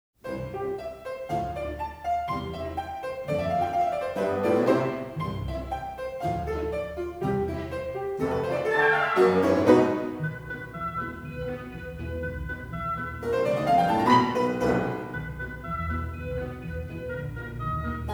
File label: Polka; Prokofiev; Pushkiniana; Gennady Rozhdestvensky